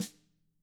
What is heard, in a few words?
CAM HIT, CAMCO SNR; ILIO; Double Platinum Drums 1